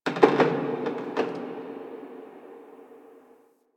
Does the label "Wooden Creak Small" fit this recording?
Yes